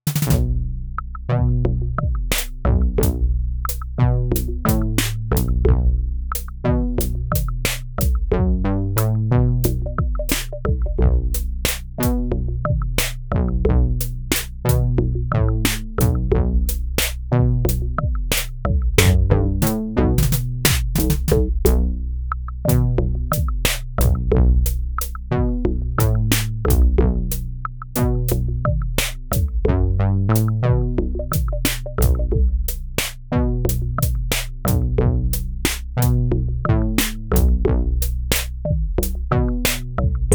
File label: 90PB RhythmSection dry